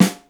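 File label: snare tight, Yamaha Motif ES-Series; 300 Drum Machines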